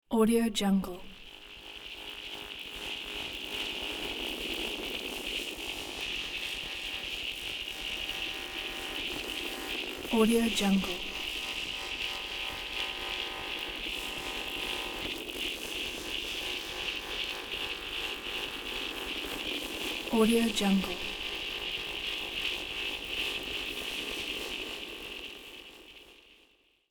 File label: electrical interferences background